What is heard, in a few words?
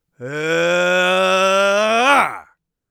magic attack, Mage Voice Pack